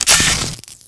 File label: ELF underwater, weapons; Tribes2